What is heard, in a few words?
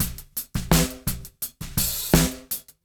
no kick 084, Beat Loops; Zero-G; Monster Beats